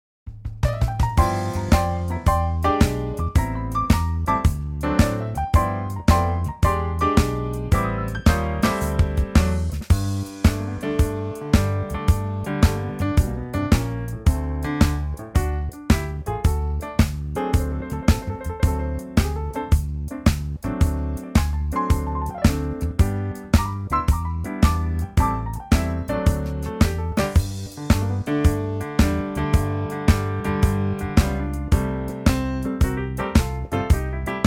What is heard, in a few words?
G Trio